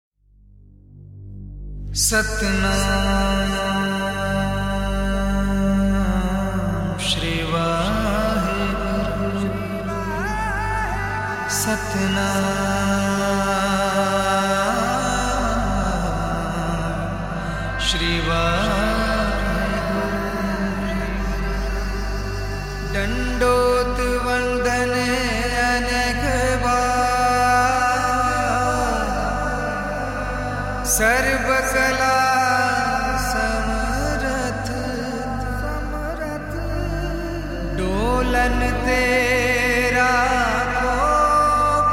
Gurbani

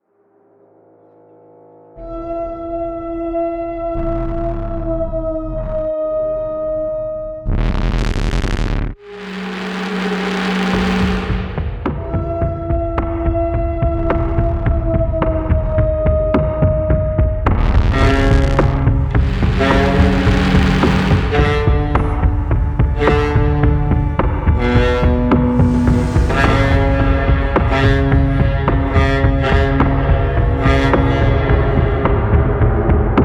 Instrumental

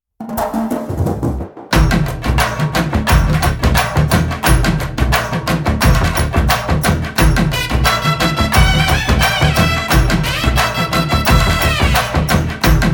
Instrumental

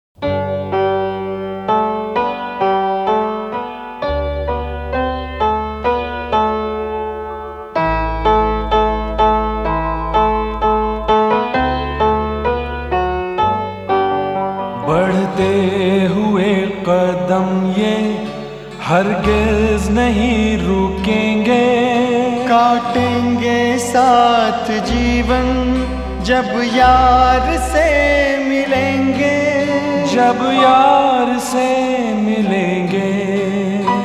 Slow

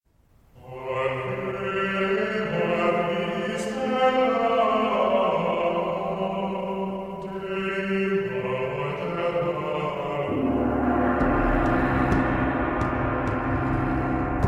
Theme Music

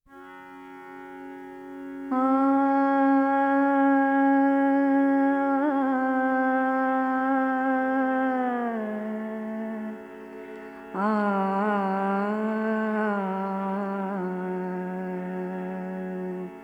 Alaap In Raga Todi

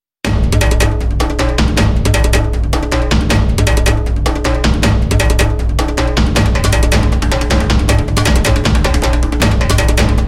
The Water Ritual 2 (Instrumental)

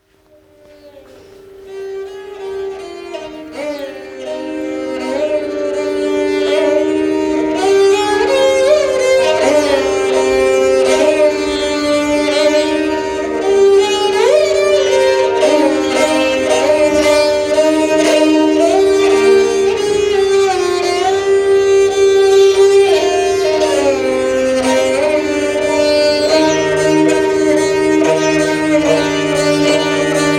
Sarangi Blues

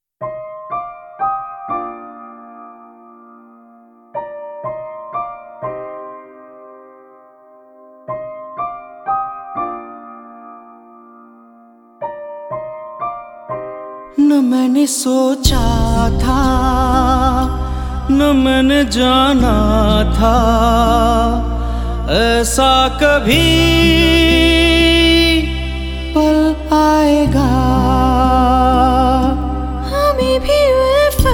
Sad Version

